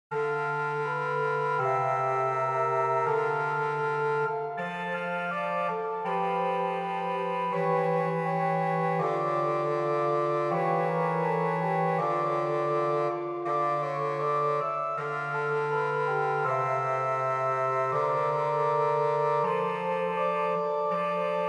Hymne; Beauvarlet Charpentier JJ Journal; Assomption

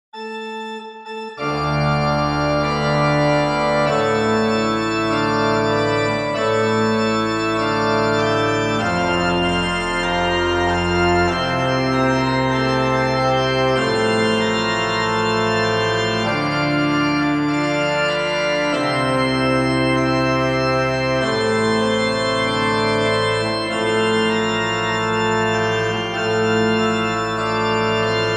Gloria Qui Tollis Plein Jeu; Beauvarlet Charpentier JJ Journal; Messe Royale

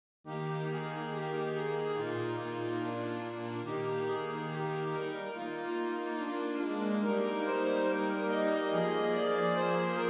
Andantino Eb, harmonium; P Posthumes; Franck

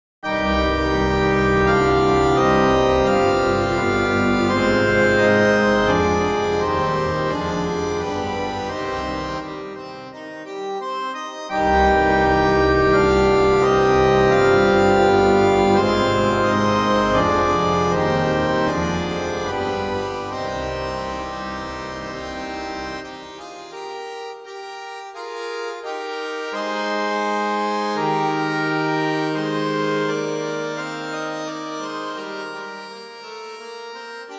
Kyrie Grand Choeur Noel, harmoniums; P Posthumes; Franck

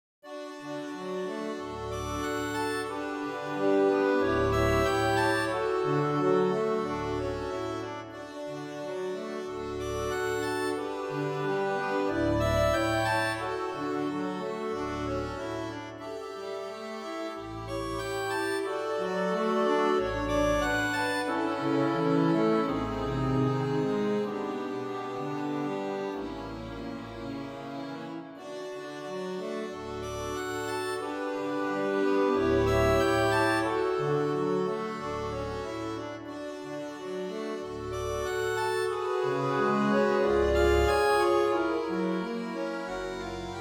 Allegretto D, harmonium; P Posthumes; Franck

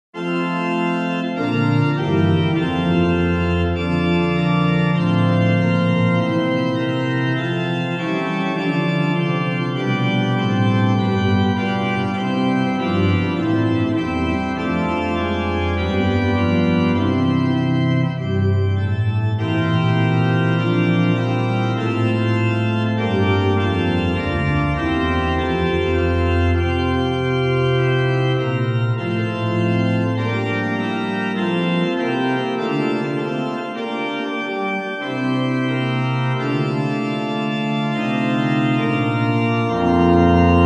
Amen, organ; Livre Souvigny

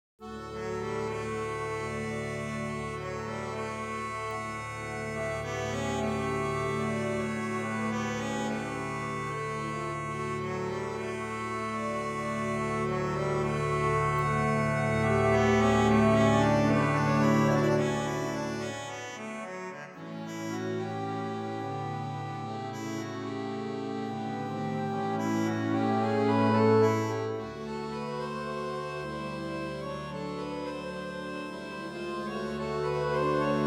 Andantino D, harmonium; P Posthumes; Franck

